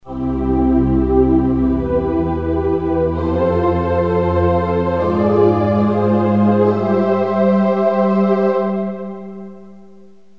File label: Choir Pad; Generalmusic pRP8; Preset demos